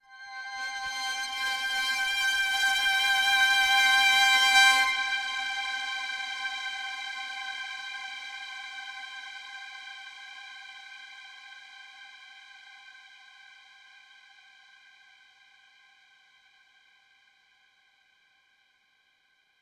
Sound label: Overlay Dark; Benefactor